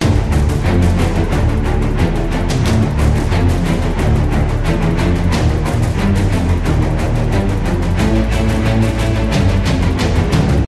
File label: Epic; orlamusic